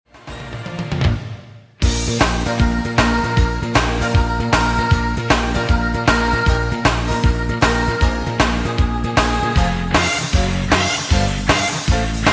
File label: Karaoke